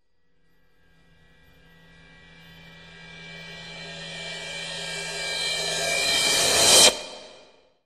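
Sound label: sounds with effect of completion, ending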